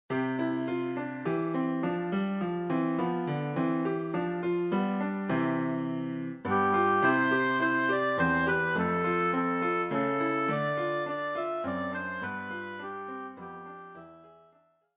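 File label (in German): We wish you a Merry Christmas Oboe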